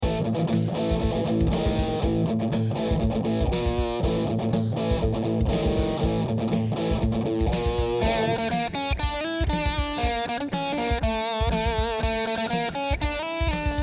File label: arabian metal